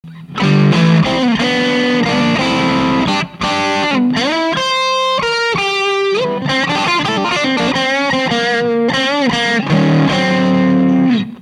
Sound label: 082, BOOST, TL082CP; Texas Instruments社製らしいです